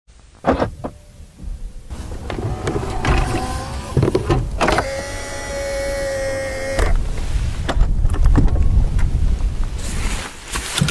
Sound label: rolls royce